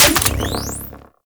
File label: Shotgun Mech, Weapons; Warframe; Corpus